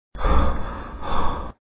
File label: Voices Tired; pencak silat 2002 gamerip online